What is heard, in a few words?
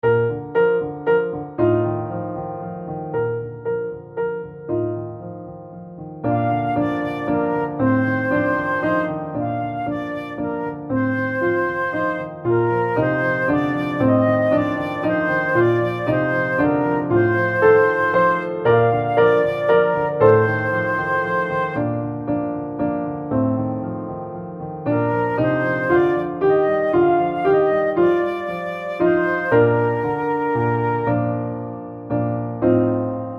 springtime waltz practice